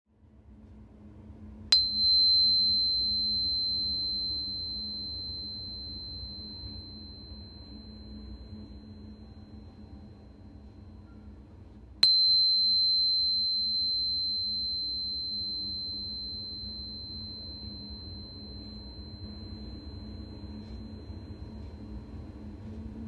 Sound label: crystal tuner